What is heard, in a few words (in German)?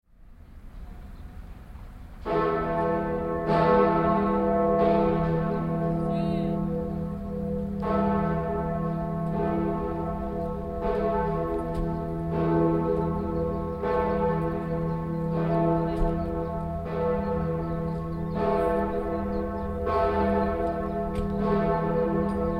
Dresden, Kreuzkirche, Glocke